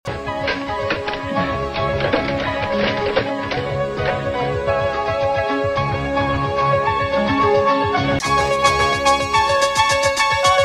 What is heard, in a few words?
dramatic theme